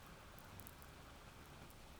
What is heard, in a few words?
pulses, BALAENOPTERA; MONACO 2022-07